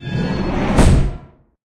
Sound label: garage door slide